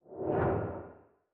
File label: Breakup Platform Fly